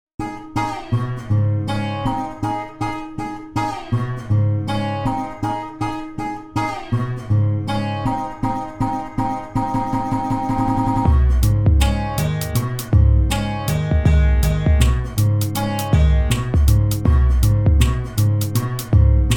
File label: Beat